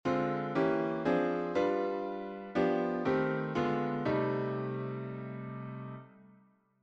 lick, drop 2 voicings

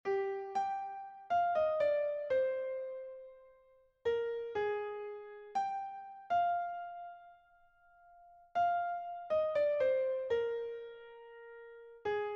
improvisation jazz; Piano Jazz

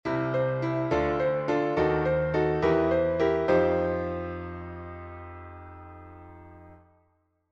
transition, Walk up I -> IV, Blues Piano; Transitions; Blues Licks

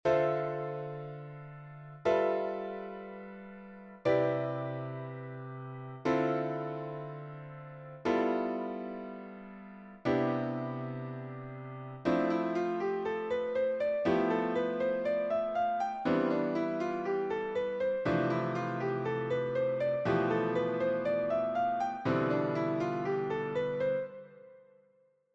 voicings, jazz; S'il n'y a qu'une position d'accord à retenir, c'est celle-là.; 2 versions : soit on part de la 3ce de l'accord, soit de la 7ème.; Enchainements ii V I

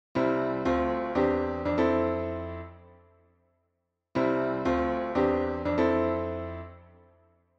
I - Isus2 - III - IV, gospel piano; accord Csus2/E; Enchainements d'Accords

